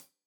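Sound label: hat closed, hi-hat cymbals stereo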